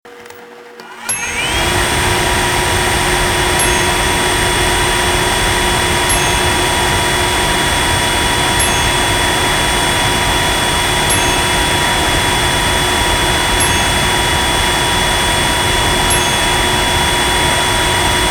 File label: Vacuum Or Shop Vac